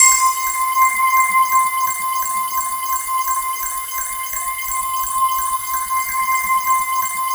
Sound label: C6, SYNTH; MYSTICON; Q Up Arts; Dream Experience